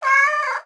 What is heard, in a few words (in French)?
chat